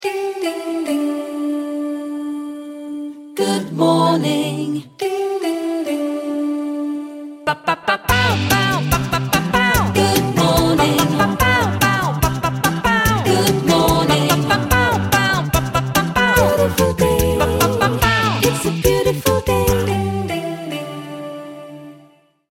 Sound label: Acappella Good Morning, alarmas para smartphone